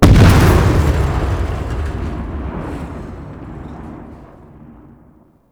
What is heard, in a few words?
Air Bombard Explosion; Warframe; Venus